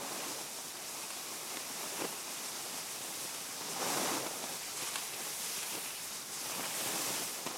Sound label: Ice Move; Game rip; MiSide